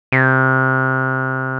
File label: TB 303